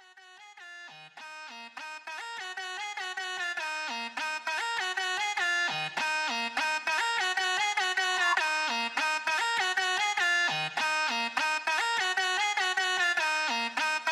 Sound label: moombahton type beat club banger type beat